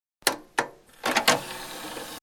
CD Drive, Mechanical Opening and closing of CD drive.; CD Players; Electronics; Compact Disk Player